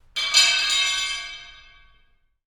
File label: Metal Pipe Falling On Concrete In Basement